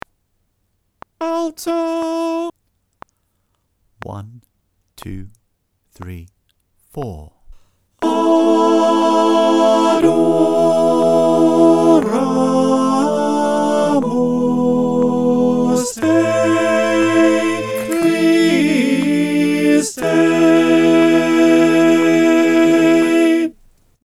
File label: Adoramus Te Part 1 - alt-click